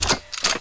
reload; X-men; weapons

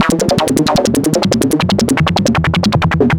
Ring a' Ray 160; Sonic Foundry ACID; Syntonic Generator

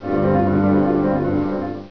close about; ところっちmac2.27

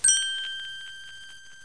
Catch; Bible Fun Pack; Education Publishing Concepts

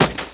SATCHEL PLACE; SpecOps2 MPlayer Demo

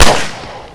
p38 fire, weapons; Spearhead Demo; MacFormat 2003 June

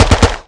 bump

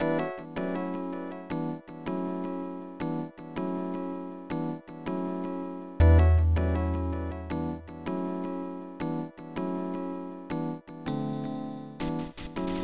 small drumba

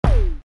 ground; VenusMission; Aventura

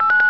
Msg Rcvd; EasyTalk

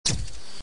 Menu Command; Lara Croft- Tomb Raider- The Cradle of Life

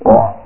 Kroink!; Bert 1.0a2

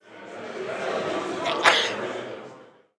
hospital; Immortal

